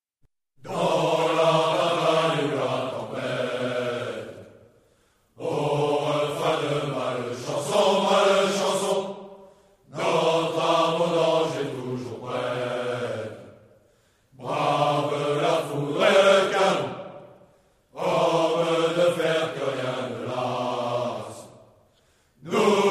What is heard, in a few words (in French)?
Hymne; Hymne de Infanterie de Marine